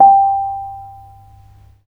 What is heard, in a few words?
BONANG G5; Spectrasonics; Heart of Asia